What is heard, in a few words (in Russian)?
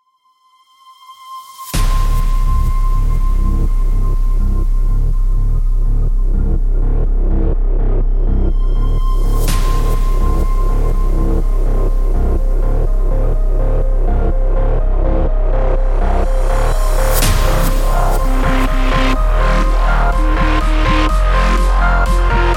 muzyka dlya instagram